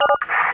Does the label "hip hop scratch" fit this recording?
No